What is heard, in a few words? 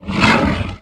vox attack